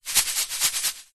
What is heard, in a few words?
food sugar bag shake